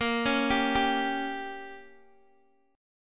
Bb Minor 6th, Guitar, Open D

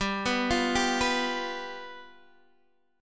F Suspended 2nd Flat 5th G

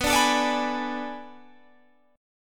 B Minor 13th B down Guitar Open G

